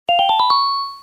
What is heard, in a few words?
question mark; sfx